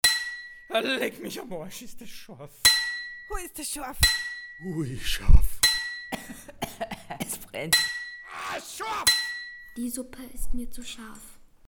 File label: rington